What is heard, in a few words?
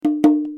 bongos